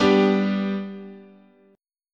G Major, chord

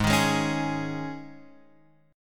Gsharp Suspended 4th, Guitar, Open F; strummed; x,3,3,3,4,3

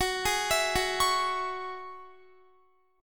Gb 7th Suspended 2nd Gb down Guitar; Gb7sus2; arpeggiated

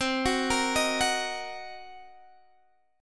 C Minor 7th Flat 5th Guitar Standard, chord; Cm7b5 arpeggiated; JGuitar

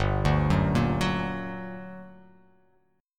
G Minor Major 7th, down

